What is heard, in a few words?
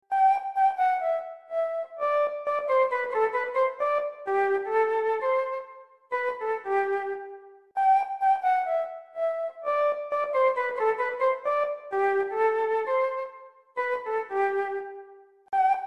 Flute